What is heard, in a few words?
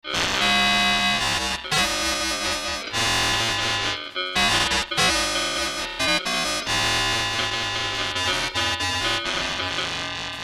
solo